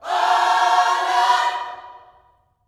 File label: OH LORD, Vocal Planet Gospel